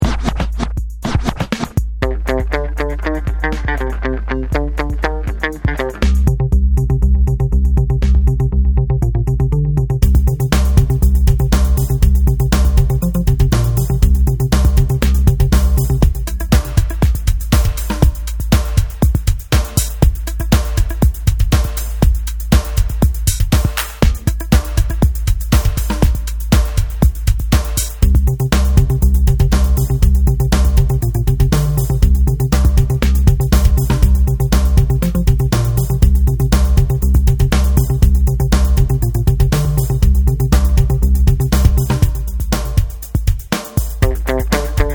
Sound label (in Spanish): sin voz